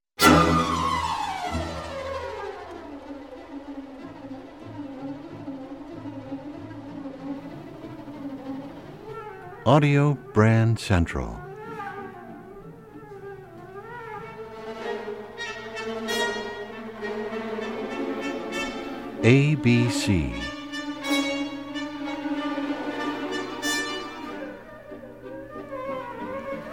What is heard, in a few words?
Rimsky Korsakov Flight of the Bumblebee; ABC Classical Trax